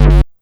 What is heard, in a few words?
Synth Hit G